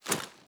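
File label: weapon foley assault rifle reload empty rotate; gmod; viper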